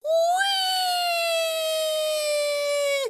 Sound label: weee hahaha oh